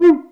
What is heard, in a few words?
GUICA, LATIN PERC; Greytsounds VOL-1